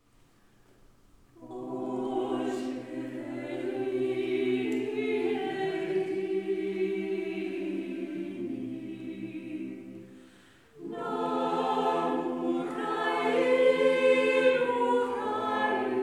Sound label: Prayer for Ukraine Choir; St Peters Choir